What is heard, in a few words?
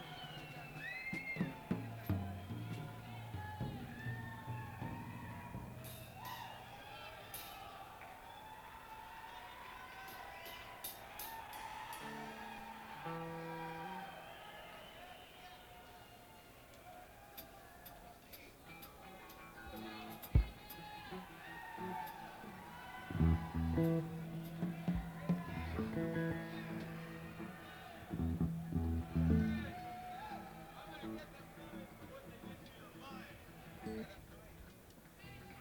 tuning